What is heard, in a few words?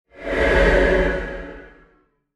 Whoosh Chorus Descend Airy; Blastwave; Whoosh Sound Effects